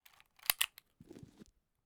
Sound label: loading round bullet